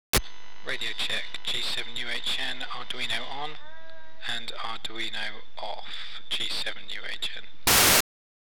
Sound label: Arduino noise internal mic